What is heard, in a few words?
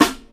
Nice Snare D Key; snare drum samples